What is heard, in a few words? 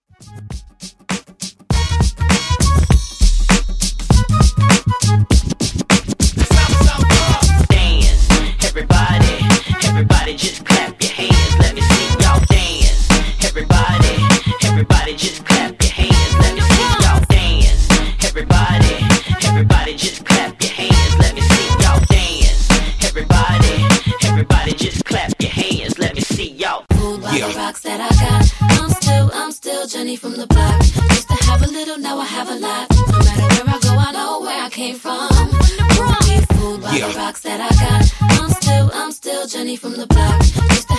Party Intros Dance 100 Bpm